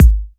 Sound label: Bassdrum; Vengeance Samples; VEE Bassdrums